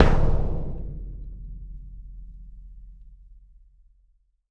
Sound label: Biggest Kick Drum Ever, Percussion One Shots; Essential Percussion